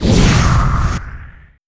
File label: time walk; weapons; aeons; dota; faceless void